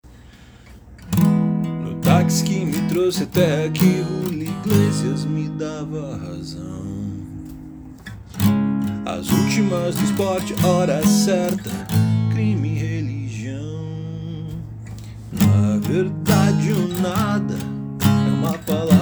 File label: Piano bar